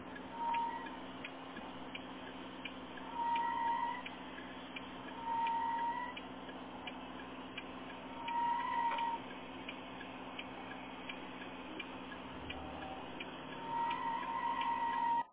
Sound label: whining whaling noice engine bay engine noise; s2000 under hood